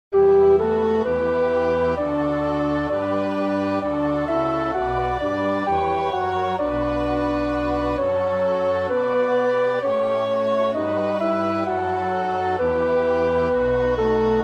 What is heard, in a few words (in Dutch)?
tenor, koorpartij oefening; Bach (Johann Sebastiaan); BWV 007; 7.Das Aug allein das Wasser sieht